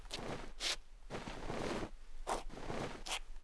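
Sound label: at the table; za stolem; Mafie 1 cz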